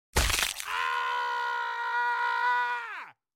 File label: pain scream